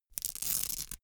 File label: velcro open cap